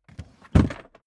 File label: suitcase swing case close